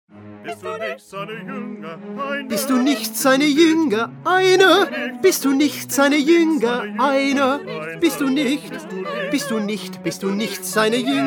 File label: Tenor; Bach; St John Passion